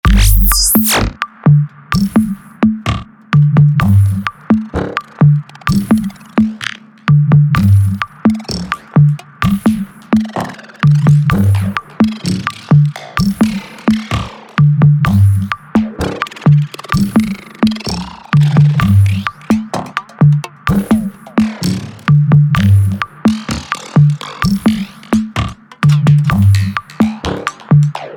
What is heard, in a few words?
Echo Processing wait music